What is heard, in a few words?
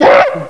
pain, gorilla; valve